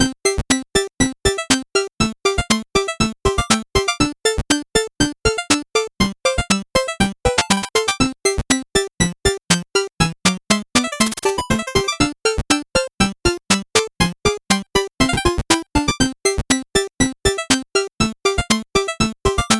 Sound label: 8bit bgm slow